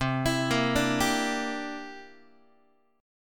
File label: C 9th, Guitar, chord; C9 arpeggiated; JGuitar